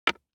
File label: DICE on Hard Wood, Drop, Standard, 1 One Die; Samples; ArticulatedSounds; DICE Sfx Party (Extended)